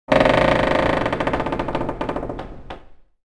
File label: Hub Amb; Levels Hub Swamp 01 Music Ray